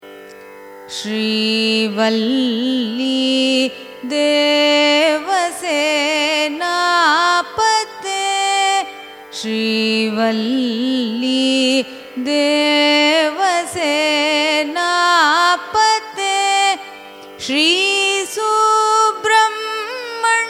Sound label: raga natabhairavi carnatic; carnatic music